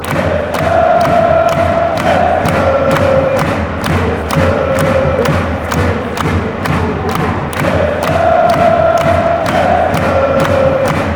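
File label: fanchants, football chants; dresden dresden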